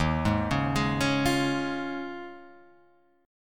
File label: Eb 9th Suspended 4th, Guitar, Half Step Down